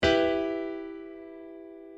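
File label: piano chord E minor; circle of fifths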